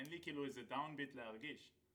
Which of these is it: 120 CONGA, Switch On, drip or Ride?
Ride